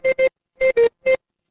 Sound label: feedback confirm